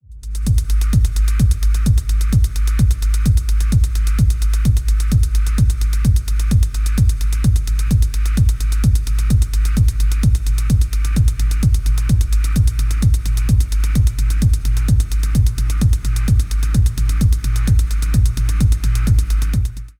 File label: Techno extract Mix